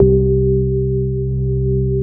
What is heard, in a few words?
HOUSY C4; Q Up Arts; Dream Experience; DANCE